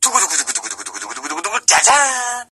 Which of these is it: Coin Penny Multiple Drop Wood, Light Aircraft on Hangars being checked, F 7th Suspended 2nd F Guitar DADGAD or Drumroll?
Drumroll